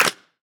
Clap; Uppercussion Conundrums

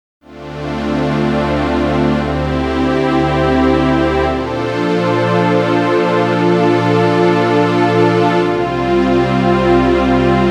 motu machfive 3 synth pad

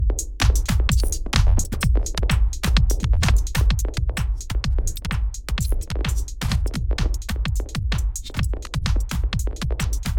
eventide h3000 lfo warper

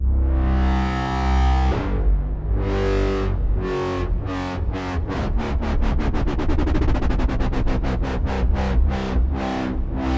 wobble; sonivox

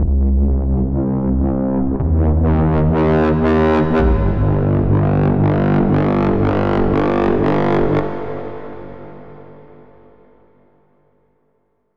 bass dry; sonivox twist

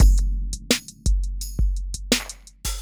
hip-hop drums charakter-sample

